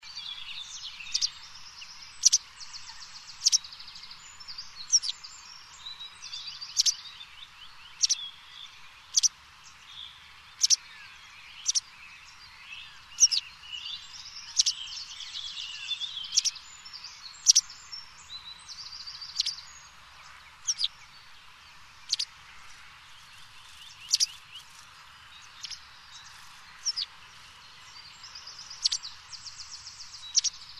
Bergeronnette grise

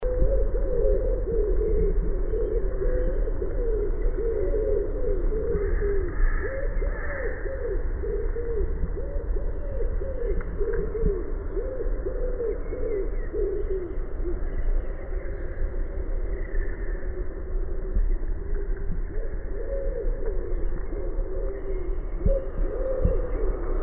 Pigeon ramier

